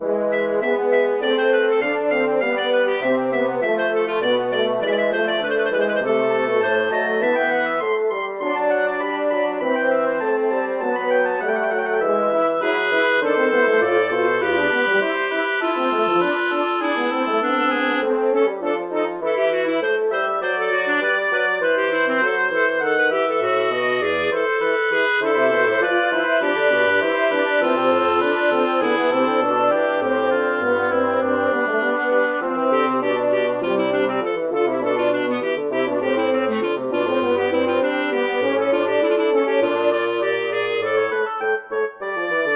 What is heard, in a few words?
prelude mineur; albrechtsberger johann georg